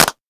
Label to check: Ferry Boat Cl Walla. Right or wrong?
Wrong